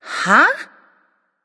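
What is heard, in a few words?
confused, female; smite; voicepack; hera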